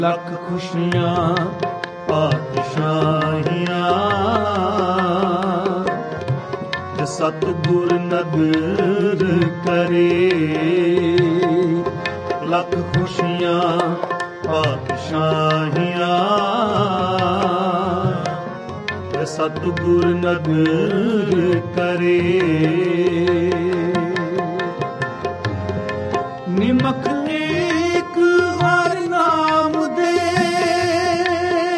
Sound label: Sikh Ringtone